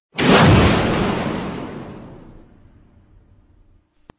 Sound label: blast robot; Jumping Angry Ape